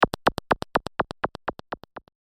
dwarf footsteps; anime

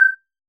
button

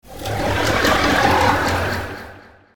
UMA scream; monster

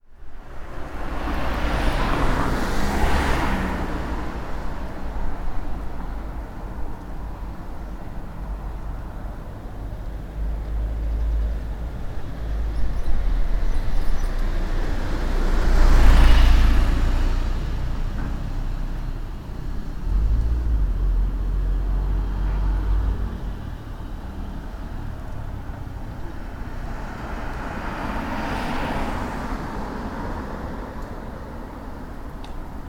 small town streets

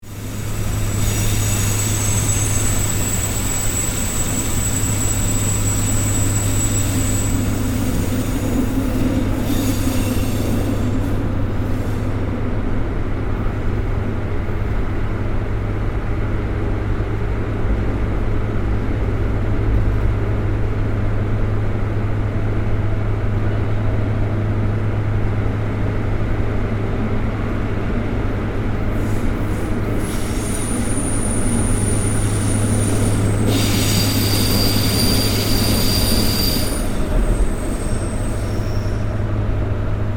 on a metro

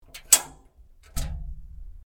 iron door open

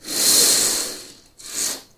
long curtain

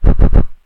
kungfu wind; attack

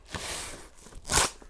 cutting plastic wrap; cooking

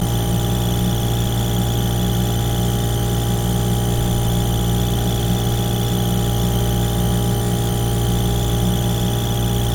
vending machine

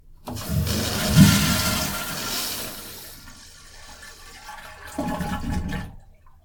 hotel toilet

